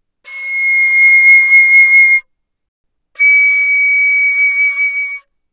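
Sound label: Flute vibrato mf C7 Db7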